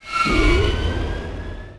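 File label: Starfighter start, vehicles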